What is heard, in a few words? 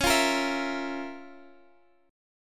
F Major 7th Suspended 4th Sharp 5th Db, guitar chord